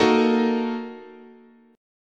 Bb Major 7th Suspended 4th Sharp 5th, guitar chord